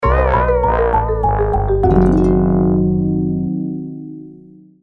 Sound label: battle fail